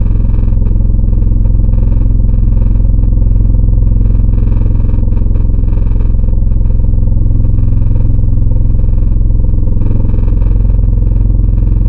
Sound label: Vocal - Simple Hum, wavetables; Synthetic's Serum Collection; ESW Core Wavetables; Echo Sound Works Core Tables